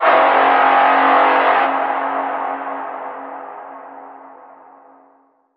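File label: Alarm Strong Motor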